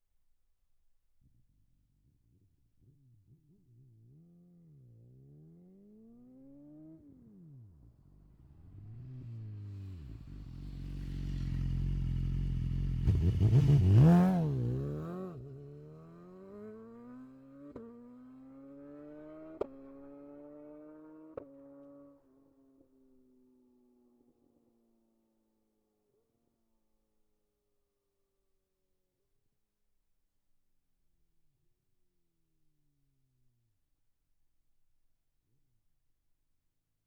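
BMW S1000RR exterior fast approach stop away passby; BMW S1000RR HP4 2012; MKH8040 ORTF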